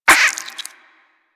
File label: Vocal Tranq Grunt